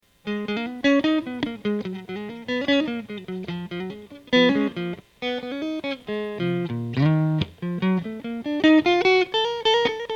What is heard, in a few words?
rock